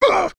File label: pain; makos